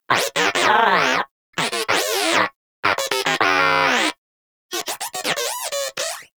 Moa Inquisitive Vocal Interact; Warframe

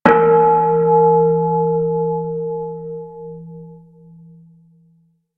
Bell; Dojo Props

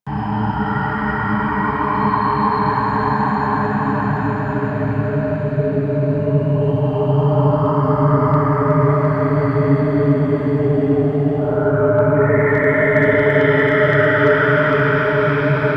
Interior Amb B, Ambience; Warframe; Orokin

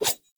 Dagger Whoosh Heavy; Sinecure Dagger

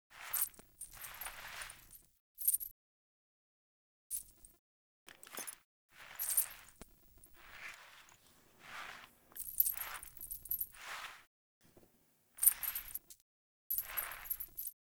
Foley; Friendly; Verminia; Bromius Quest

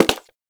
Human Loid Foley Step; Warframe

